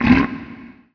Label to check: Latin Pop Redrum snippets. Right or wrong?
Wrong